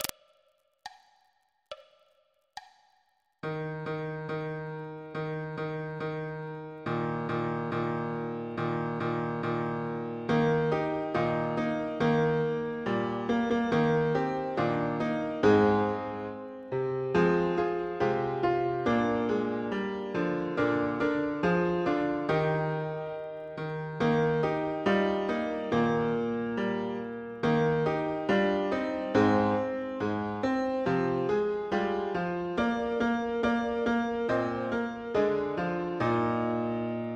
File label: jingle bells, bband